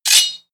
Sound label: sword pull out